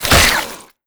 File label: detach, headcrab; creatures; headcrab classic